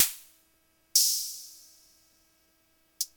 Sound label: filter, drumstar